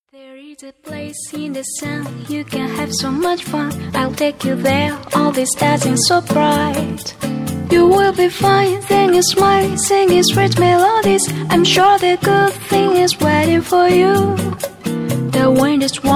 Lg Woodpecker Ringtone

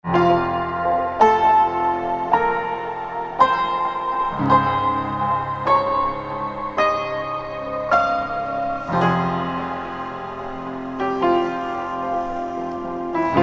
Samsung Galaxy M34 5G Original Ringtone

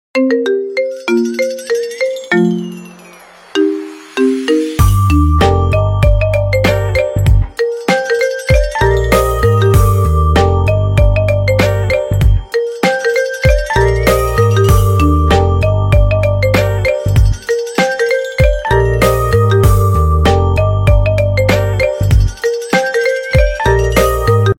iPhone Remix Ringtone

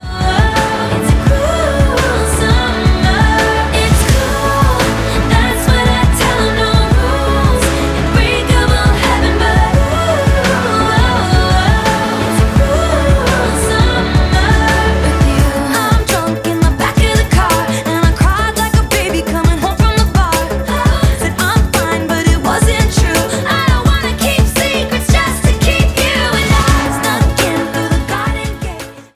Ringtone

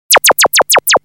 Oppo A36 Sms

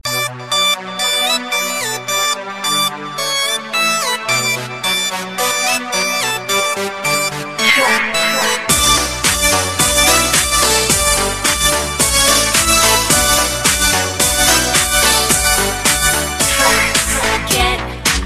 Samsung Galaxy M14 4G Ringtone

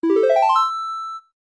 Oppo A16e Notification

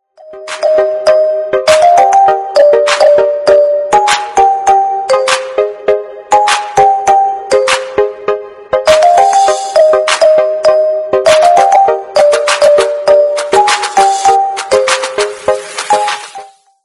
instrumental

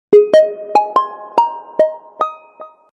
SMS Ringtone; oneplus 11R 5G

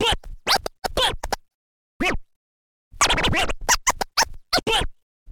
Scratch Ringtone

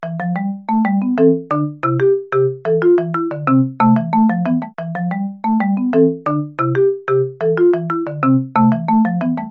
iPhone Tune

